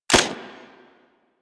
Redux Fire Mech, Weapons; Lato Vandal

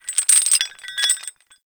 Swing Chain Move; Ambience; Dungeons; Under City

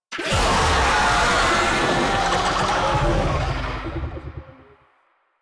Infested Oni Vocal Attack; Warframe

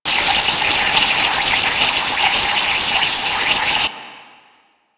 Pipe Loop; Warframe; Grineer Hammer Boss; Pipes

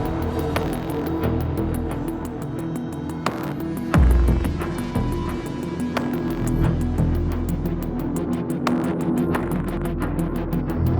Cinematic Cue, Music; Warframe; Corpus Lich; Parvos

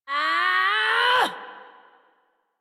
Exert Adult, Melee; Warframe; Duviri; Drifter

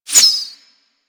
Arrow Whizzby; Warframe; Weapons

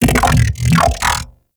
Ambient Vocal; Nechro Mech

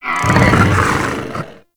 Infested Charger Idle, Enemies; Warframe

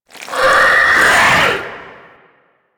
Infest Cast; Warframe; Warframes

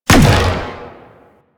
Laser Disc Fire; Warframe; Venus; Venus Bipeds; Venus Laser Disc Moa

